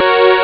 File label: esq jump; Amiga Soundtracker Sample Packs